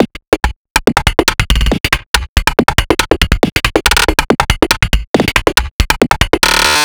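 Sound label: rhythm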